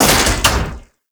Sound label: Weak Spot Vent Close; Warframe; Sargas Ruk